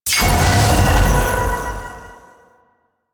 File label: Activate Tower Crystal; Warframe; The New War